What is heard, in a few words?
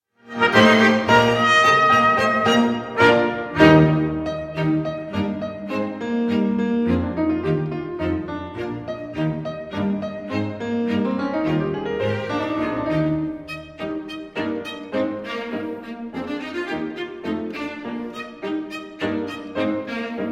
septet for trumpet piano and strings; camille saint-saens; op 65; 4th mvt; gavotte et final